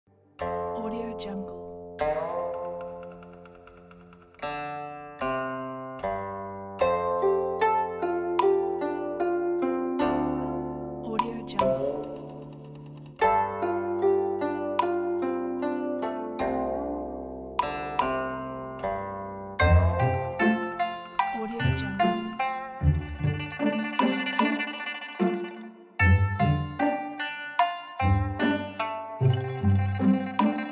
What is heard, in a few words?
traditional chinese instruments orchestra